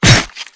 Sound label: swordman hit